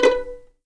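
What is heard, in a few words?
B4 pizzicato mezzo forte right, violins; East West; The Ultimate String Collection; VLNS PIZZ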